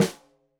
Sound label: Snare Stereo, Drums; Deeply Sampled Drums; SMDrum